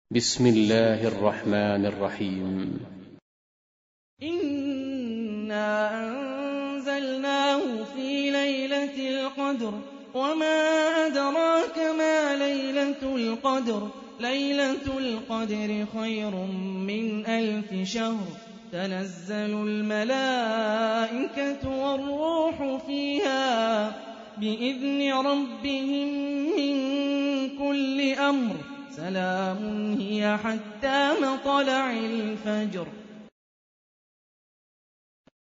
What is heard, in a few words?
Quran; Hani Refaey